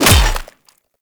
Melee Hammer Impact Flesh; Hammers

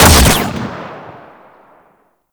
Fire 3D, Weapons; Warframe; Seer

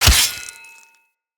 Melee Sword Impact Flesh

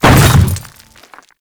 Step Dirt, Footsteps; Enemies; Warframe; Grineer Hammer Boss

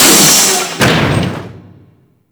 Infested Large Door Close; Corpus

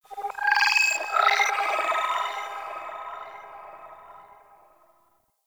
Grain Creep Bird; Warframe; Tau

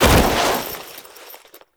Foley Roll; Warframe; Characters; Kahl

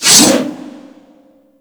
Great Sword Swipe; Warframe; Weapons

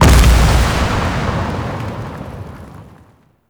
Void Dragon Orb Proj Explosion; Warframe; Duviri; Abilities

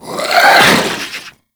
Head Shake; Warframe; Stalker

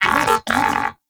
Moa Pet Agree; Pets; Warframe; Tenacious

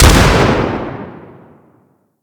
Weapon Beam Combust Explosion; Mods

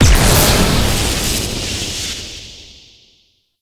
Void Dragon Orb Proj Explosion Lightning; Enemies; Abilities; Warframe; Duviri

